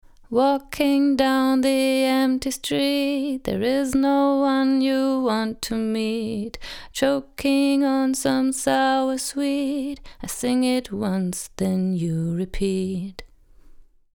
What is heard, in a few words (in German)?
Tubesessor Vocal manuelle Kompression Classic clean; Heritage Audio